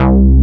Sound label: MOOG BASS; AKAI S6000 CD-ROM - Volume 1